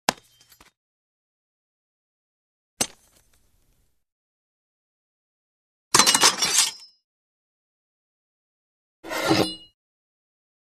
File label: Pick Up Sword Grab Sword From Ground With Light Thud; Hollywood Edge Foley Sound Library